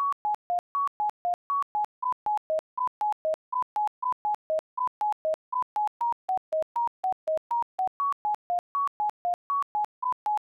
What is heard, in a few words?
Sine Wave